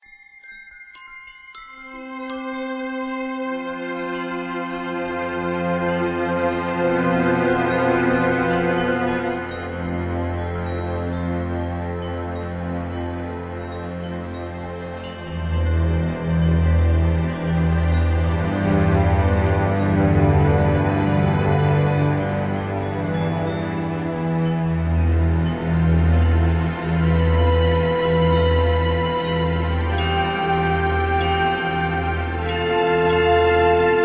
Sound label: Orchestra; triond